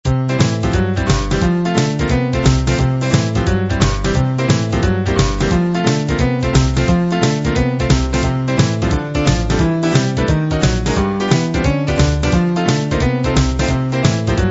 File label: boogie